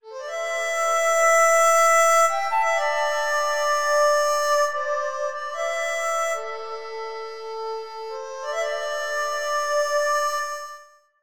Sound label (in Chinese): 笛声独奏